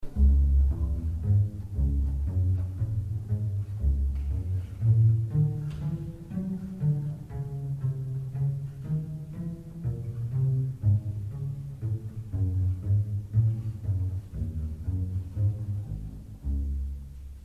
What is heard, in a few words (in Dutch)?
contrabas